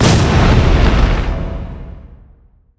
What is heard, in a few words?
inner fire; weapons; dota; huskar